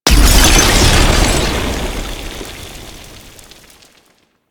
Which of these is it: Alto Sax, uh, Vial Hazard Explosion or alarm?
Vial Hazard Explosion